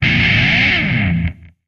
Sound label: Music E Guitar Disto